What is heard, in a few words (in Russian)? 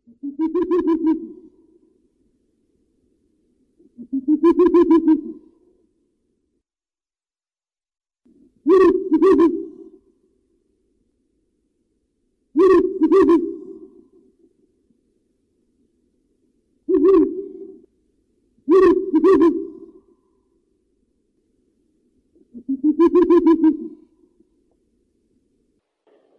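неясыть длиннохвостая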